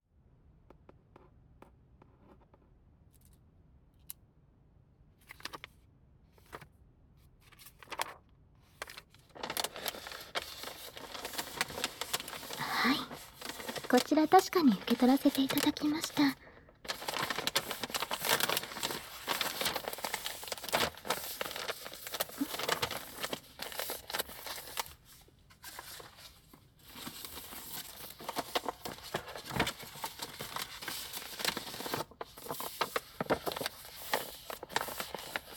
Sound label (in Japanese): 両耳舐めマッサージコース